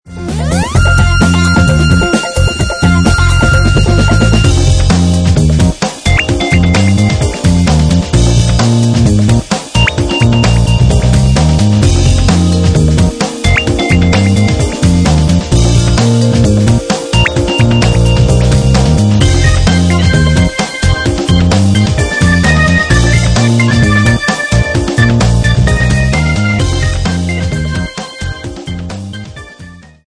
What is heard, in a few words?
jazz, polymoog